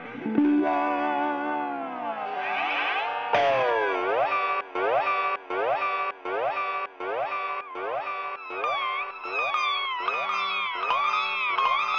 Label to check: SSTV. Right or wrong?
Wrong